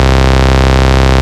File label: Basses Root C; Vengeance Samples